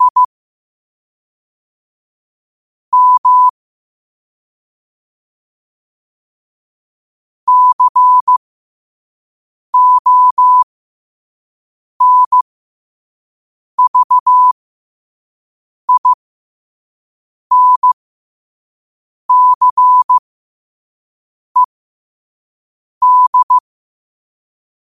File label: 5 wpm, morse